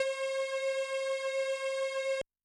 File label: Syn St; Yamaha DTXpress-II